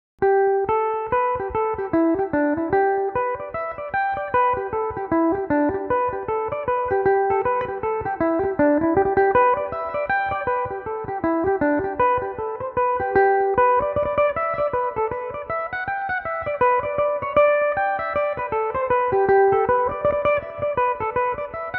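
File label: G major, Irish mandolin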